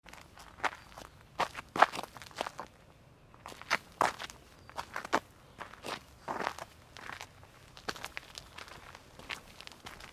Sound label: steps on stony path